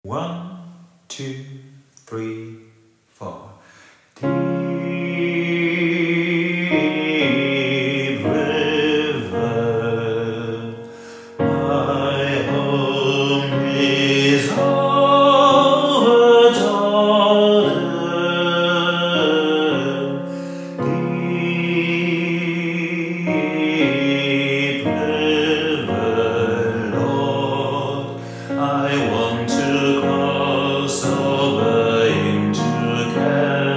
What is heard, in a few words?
Deep River Sopran; Popchor